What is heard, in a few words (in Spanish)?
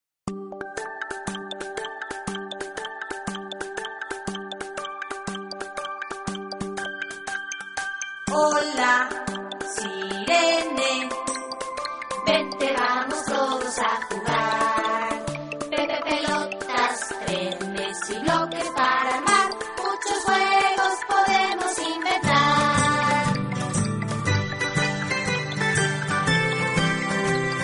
sirene